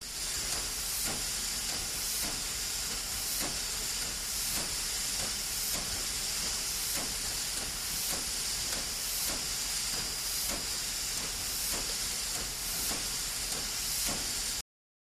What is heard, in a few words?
Steam Valves Slw Idle, steam train